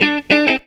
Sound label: GTR, GTR RIFFS, Funky Elements; Zero-G